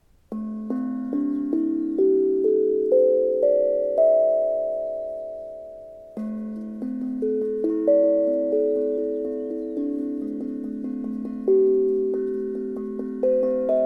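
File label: La minor pent 432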